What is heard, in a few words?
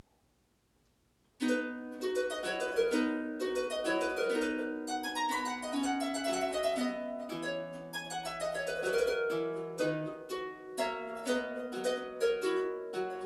harpsichord